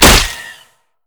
basicattacks; Zyra

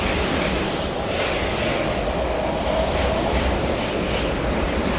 spectral loop; LoL; yorick

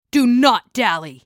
attack; FallenAngel

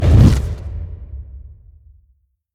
summoner spell base ignite; LoL

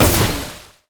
hydro blast; LoL; nami koi

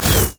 riposte; LoL; fiora

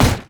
golem basic attack; monsters; LoL

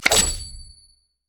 pick a card; LoL; twistedfate

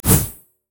lux lightbinding; LoL

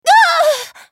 dying

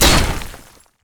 anchorchain terrain; nautilus

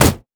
mech melee basic attack; minions; LoL

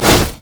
mage chains; LoL; ironforge xerath

